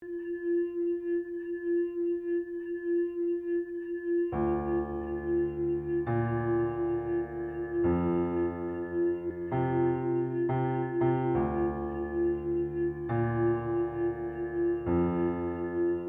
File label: inatimate singing - edm